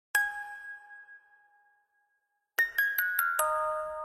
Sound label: Beat Cry